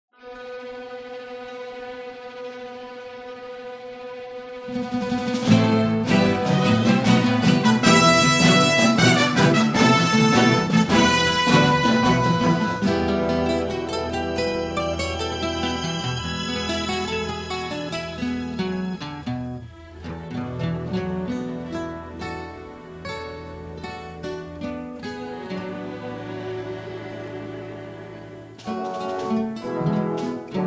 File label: B Natural Chord